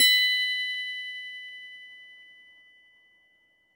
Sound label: Glockenspiel Bells